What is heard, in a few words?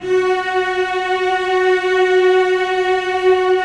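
celli sustain f#4; Sonatina Symphonic Orchestra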